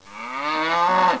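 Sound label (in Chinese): niu jiaosheng, cow mooing; jiachu jiaosheng; jiaqing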